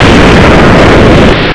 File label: shockwave; mother2